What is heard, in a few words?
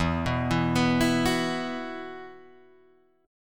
E Suspended 2nd E, Guitar, Drop D